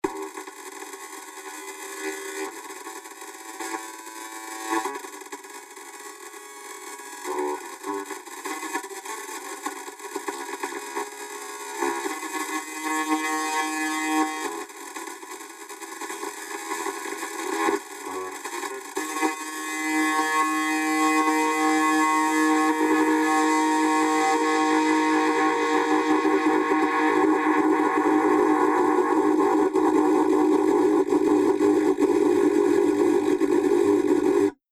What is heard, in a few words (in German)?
kaputter Fernseher; T2 LPC